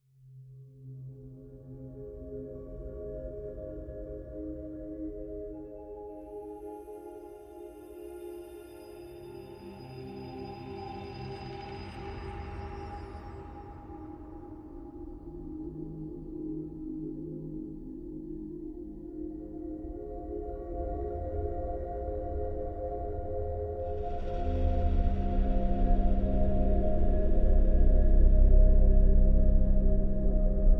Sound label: ambient